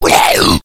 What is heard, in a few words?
attack, slender shiver